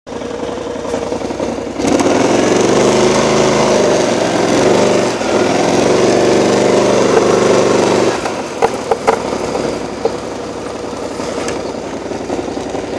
Claquements C650GT, moto

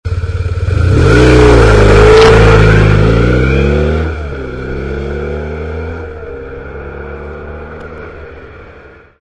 demarrage, moto r1150gs

